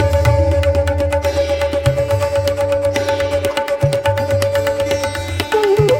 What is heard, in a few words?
Yaman, hindustani raag